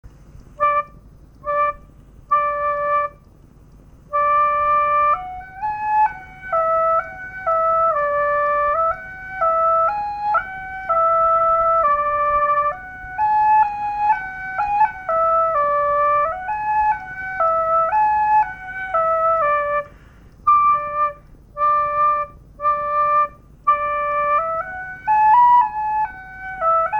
shrine dictorphone mexico, street recording

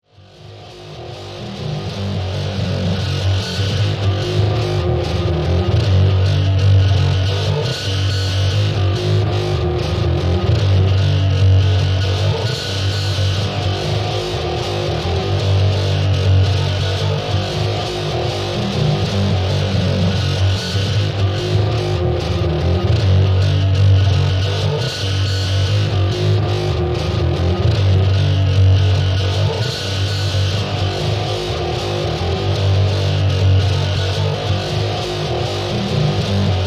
something bass llooop machine

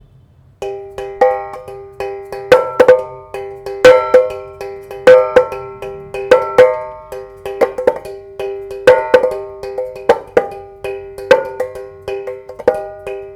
drum bottle, South India; South India street recordings

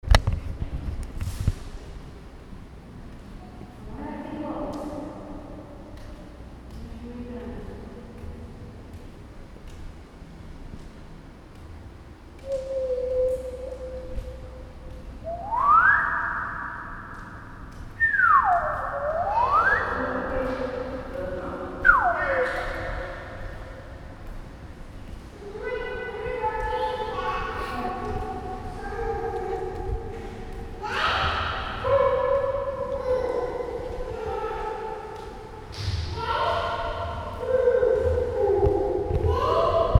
echo sounds in lighthouse children; South India street recordings

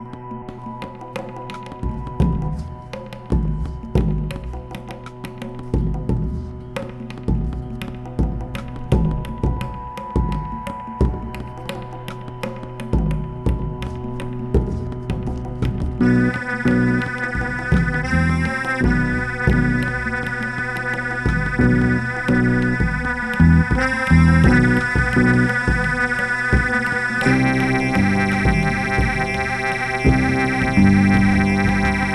Tabla; Spy Mash Doctor; Thirty Suva Sound Sketches

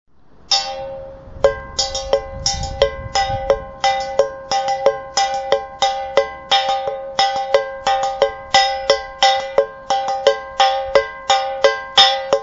Bottle bay

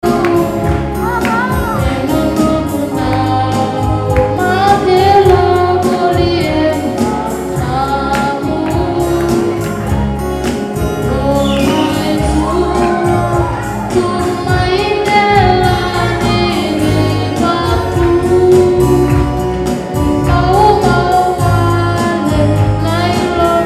karioki, Vietnam; 2019

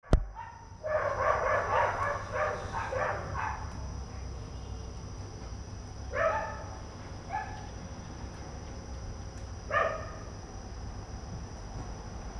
fiji dogs; 2019

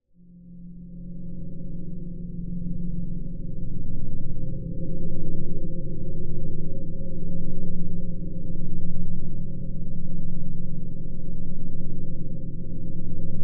electric gamelan ENGINE NOISE

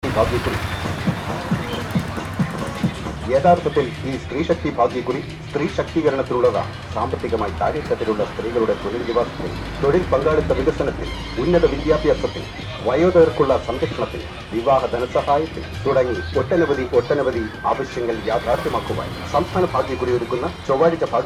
lotto chants, South India street recordings

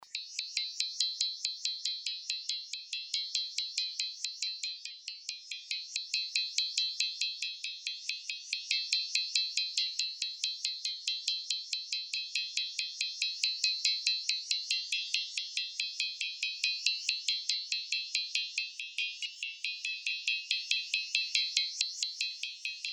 electric gamelan METALIC HIT HIGH

